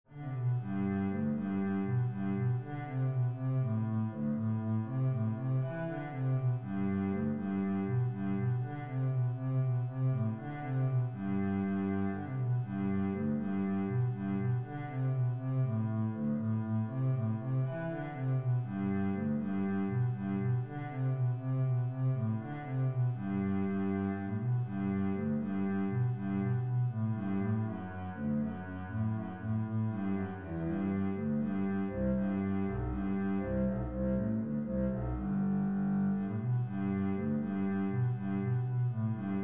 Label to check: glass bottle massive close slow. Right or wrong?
Wrong